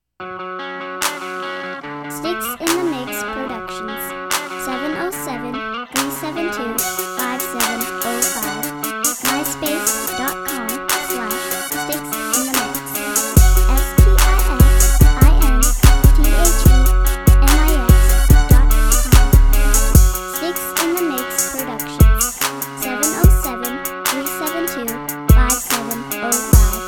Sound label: crunk